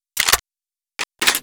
laser reload

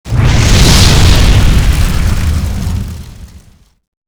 dragon breath fire

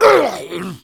fast zombie pain

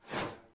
miss

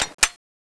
draw, chain grenade; tfa cso

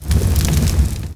fire; snds jack gmod

